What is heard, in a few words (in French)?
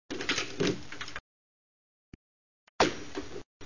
texture porte